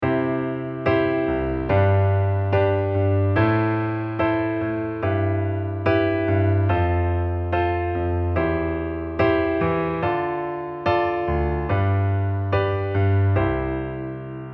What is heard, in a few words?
LH octave, accompaniment pattern